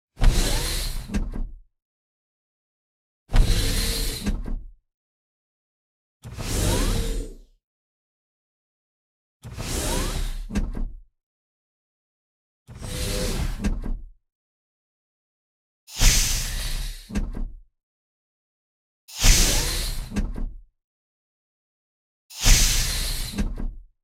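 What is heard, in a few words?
Sci-fi door Spaceship Compartment Door Different Variations X8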